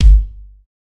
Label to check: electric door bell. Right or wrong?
Wrong